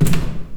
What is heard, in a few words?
Stairs